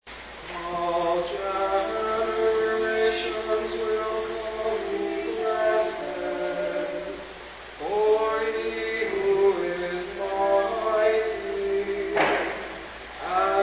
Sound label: Communion Antiphon